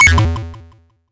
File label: corrupt; Blokos